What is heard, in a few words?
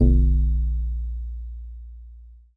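Steel Drums DX100 A#0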